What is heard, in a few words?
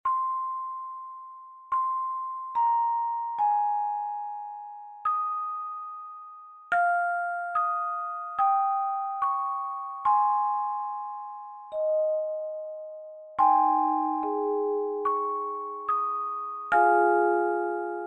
Abide With Me 3 octaves handchimes